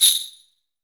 SUZU

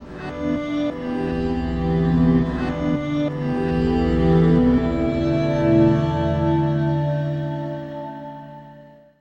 Scree Song, Acoustic Drone; Sonic Foundry (Sony Creative Software) - Friendly Fire; DroneLoop

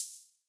HH, Dance Mega Drums; Best Service; HH HOU

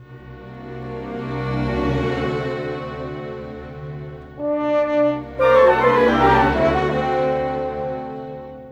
Orchestra, Rock Pop; Sonic Foundry (Sony Creative Software); Orchestral Series VOL-4; Rock and Pop; Rock-Pop 23

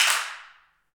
PRC, Clap Modules; Roland L-CDX-01; Clap & Snap

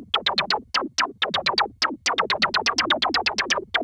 Juno-60 Arp 125BPM

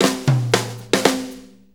6-8 Fill, Drum Tools

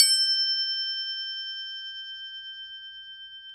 Crotales, Orchestral Perc

